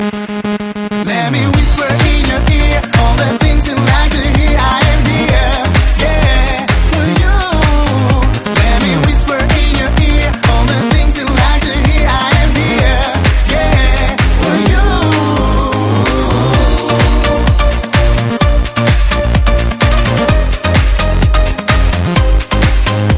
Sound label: Whisper